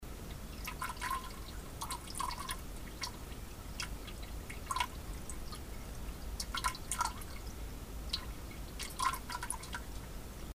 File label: Penultimate Dribbles, Plumbing